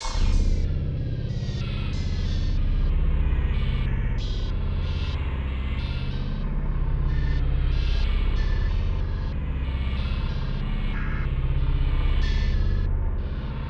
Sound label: suspense